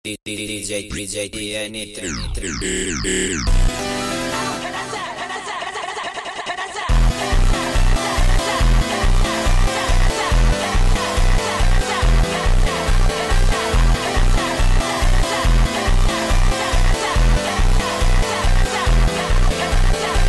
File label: Funk sped Up